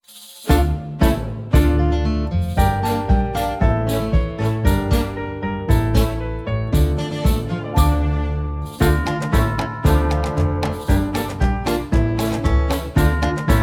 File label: we three kings backing